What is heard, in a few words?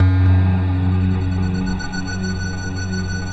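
mystery pad, Stack; Optical Media International; Sonic Images Library; Top Secret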